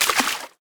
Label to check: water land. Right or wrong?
Right